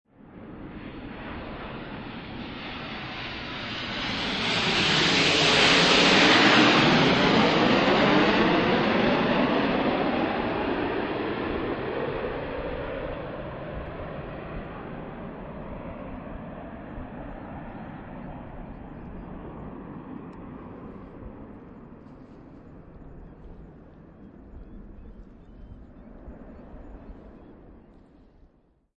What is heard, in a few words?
Jetfighter Fly By, Jet fighter Fly by, distant; Airplanes; Vehicles